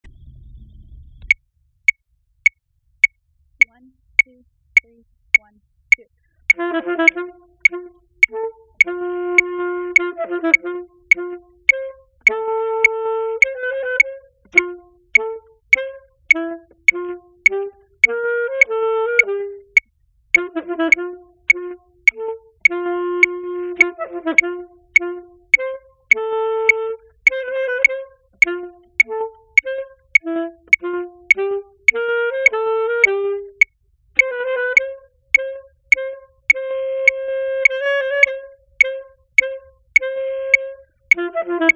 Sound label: minuet and trio